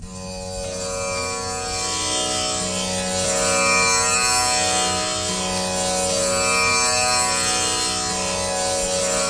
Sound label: Tamboura; Far East Drones and Plucks